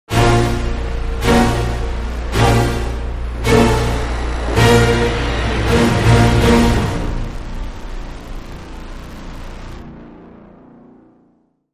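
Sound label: Dramatic Sting, No Percussion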